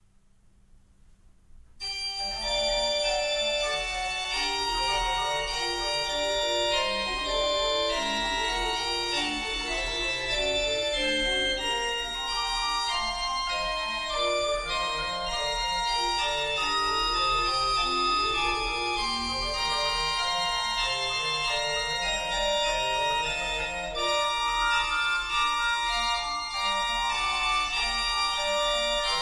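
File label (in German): Godefroy Hartmann 17 II P, pfeifenorgeln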